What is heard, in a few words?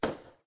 fire; Destroy-Debris